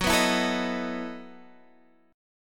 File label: E Major 7th Suspended 2nd Suspended 4th